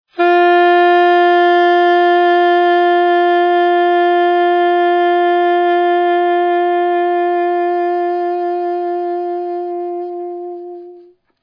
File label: vibrato, sax